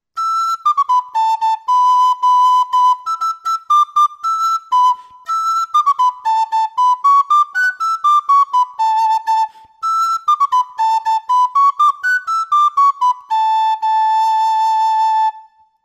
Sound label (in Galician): trad, Pito Galego